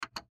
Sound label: Cd Player Button; Technology; Blastwave